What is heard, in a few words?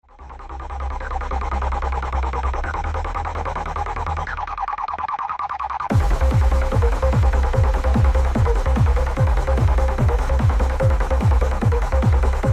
DIY Rave Beats, Techno